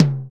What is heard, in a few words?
ROTO C3, TOMS; Greytsounds VOL-1; SDX TOMS